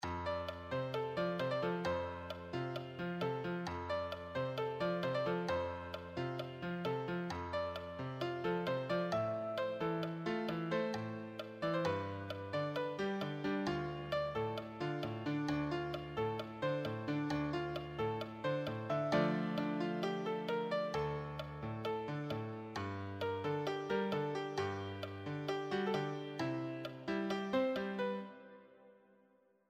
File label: solo, piano